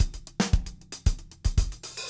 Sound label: SAMBA